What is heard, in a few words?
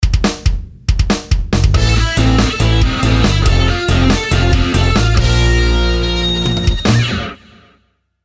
contemporary rock n roll sting